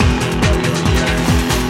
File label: rock, audioloops